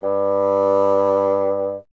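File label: wind bassoon G; Miroslav Vitous - Solo Instruments VOL-1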